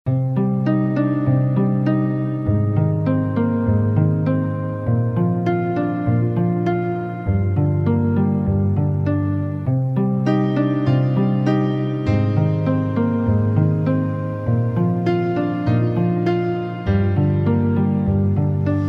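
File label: relaxing music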